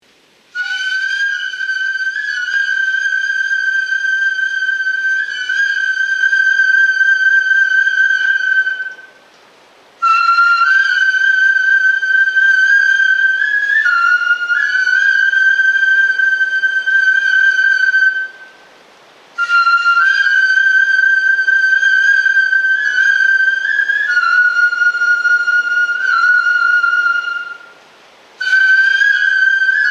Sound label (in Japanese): Fue neiro